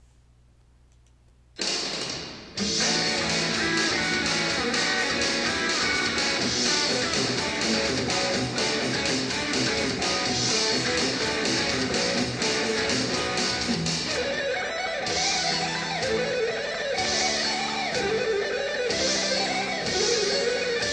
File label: Jet Blue Solo